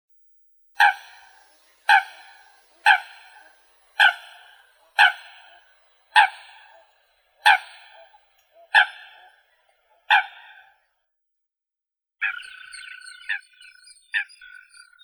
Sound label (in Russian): lisuha fulica atra